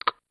bloop; cstrike

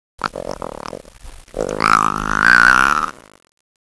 lizard, animals; cstrike

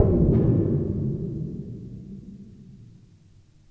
metal sheet; cstrike

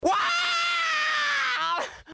wooow; cstrike

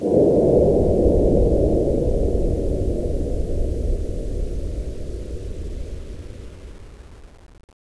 disquiet; cstrike

